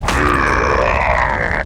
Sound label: WAR DAMAGE